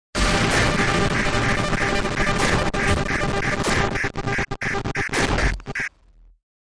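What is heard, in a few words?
Static Failed Interference, static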